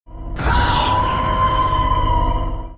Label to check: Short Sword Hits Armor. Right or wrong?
Wrong